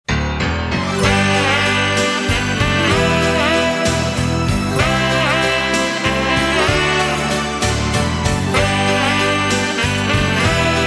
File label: moonlight melody